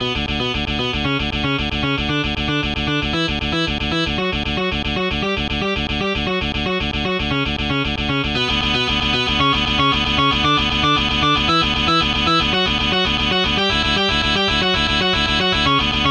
metal

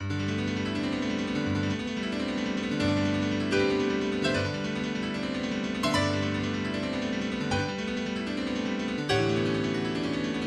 Prelude in G Major

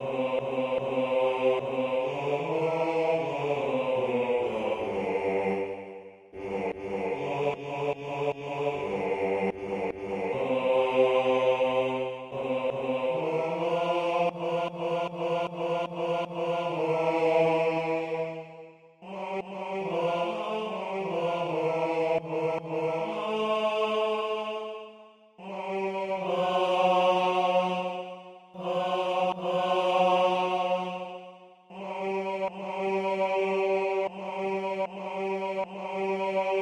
BASS

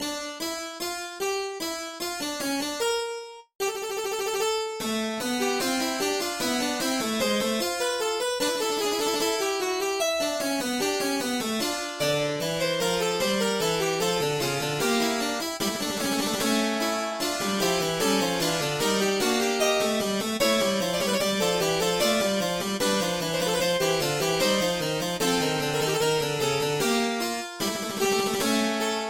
in D Minor; BWV 851

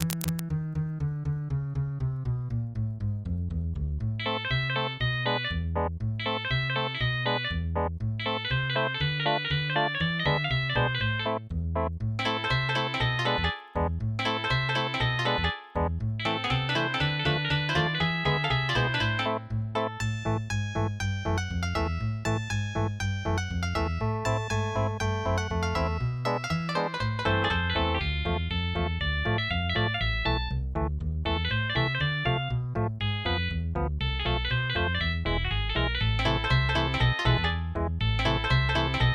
Jazz

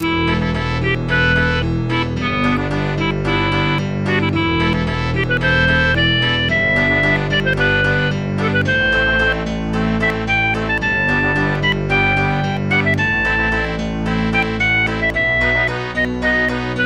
Waltz; Team Fortress 2

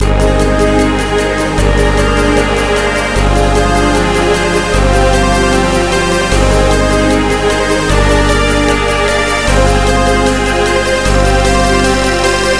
Eurobeat Remixed

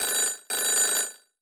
ringer quick

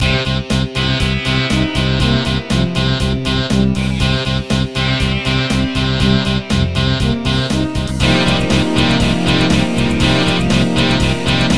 Hard Rock

